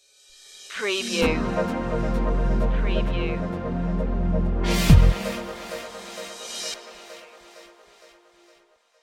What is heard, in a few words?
future run stinger